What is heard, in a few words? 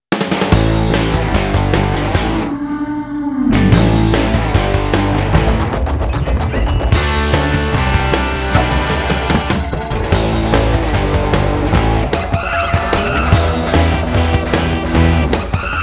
Jungle Character Intro; Cel Damage; Xbox